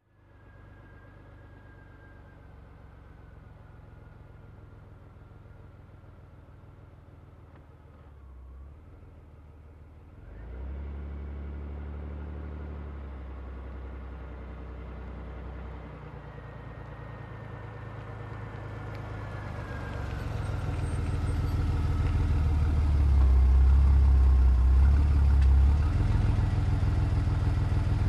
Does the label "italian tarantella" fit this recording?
No